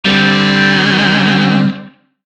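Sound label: Shawzin Pentatonic Major Chord; Warframe; Lizzie Shawzin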